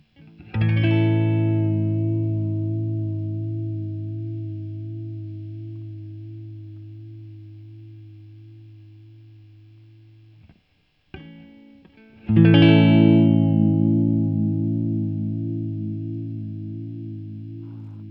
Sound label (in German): Vibrato, Verstärker